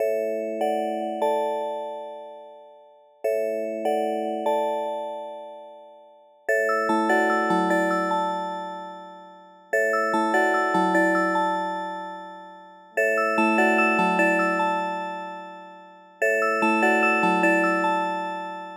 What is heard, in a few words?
alarm gentle; Google; Material Design sounds